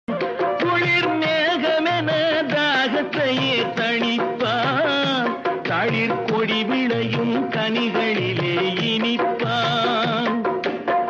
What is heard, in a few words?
tamil song